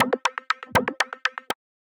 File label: Sticky Gears, Synth Rhythmic; Instruments; Wavetable; Ableton; Factory Packs; Synth Essentials